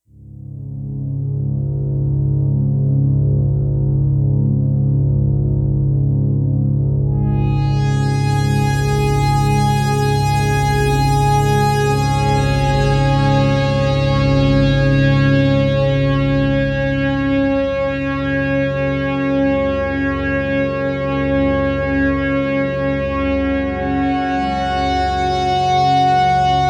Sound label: Sequential; Sequential Take5